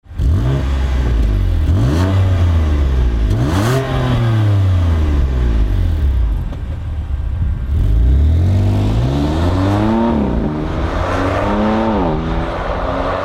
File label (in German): BMW M2 Competition Serie Klappen geschlossen